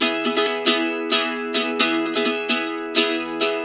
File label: 132 E, Blues Guitar RIFF